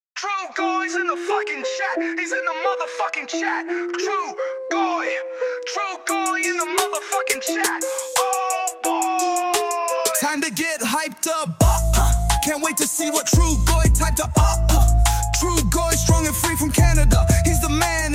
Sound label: rap